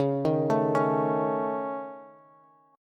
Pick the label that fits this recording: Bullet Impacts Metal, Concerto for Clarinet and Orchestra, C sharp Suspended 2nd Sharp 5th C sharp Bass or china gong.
C sharp Suspended 2nd Sharp 5th C sharp Bass